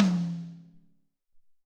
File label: Raw H Tom, Alesis SR-18